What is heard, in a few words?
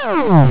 gamble loop, slots